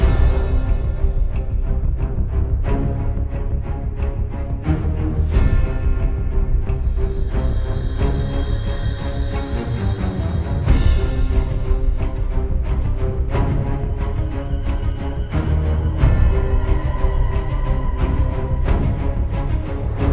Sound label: Battle Theme; Canto VI Battle Theme A2